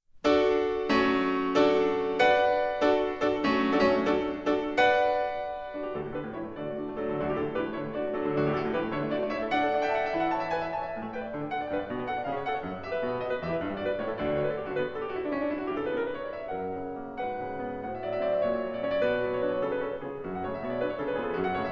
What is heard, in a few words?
danza